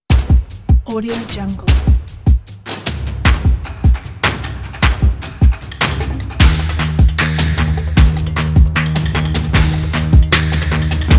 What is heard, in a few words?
modern energy commercial ident